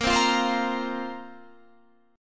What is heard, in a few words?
Bb Suspended 2nd Bb down Guitar Standard; strummed